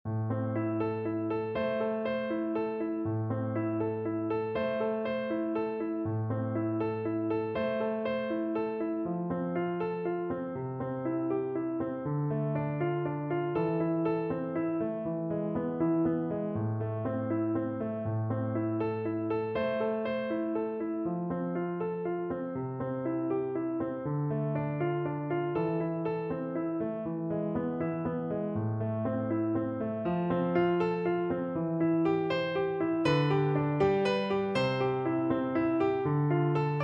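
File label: viola karaoke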